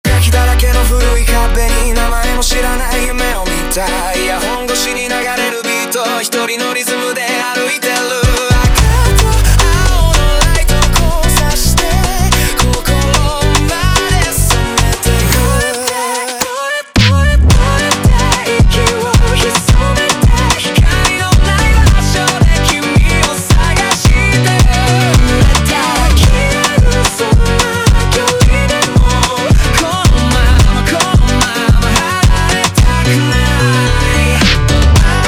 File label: Montagem